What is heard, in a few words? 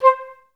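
flute staccato C4; Best Service; Peter Siedlaczek's Advanced Orchestra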